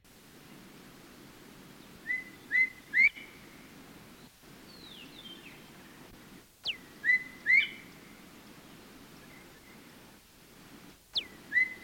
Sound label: Colinus cristatus, Colín crestudo